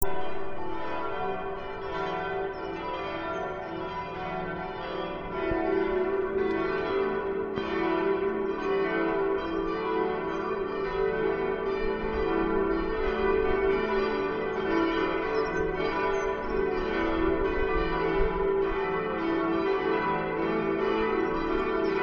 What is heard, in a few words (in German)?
Schutzengelkirche Sonntagsgeläut